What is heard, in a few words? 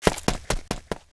run